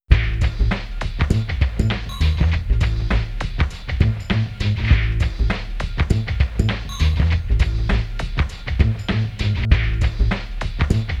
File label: Toy Groove; Natsuiro no Sunadokei